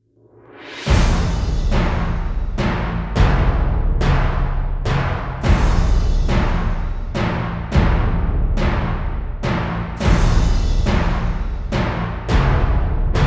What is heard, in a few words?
drums build